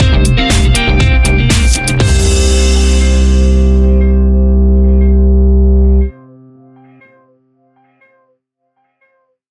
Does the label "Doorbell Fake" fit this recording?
No